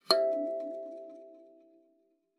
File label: KALIMBA E5; Xsample VOL-7